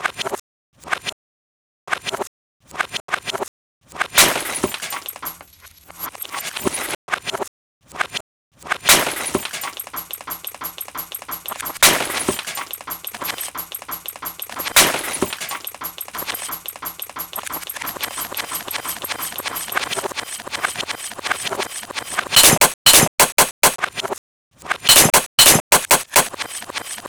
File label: Glass break